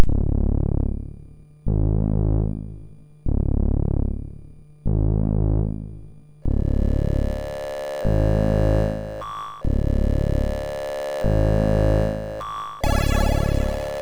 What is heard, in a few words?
Rave, MOS6581R2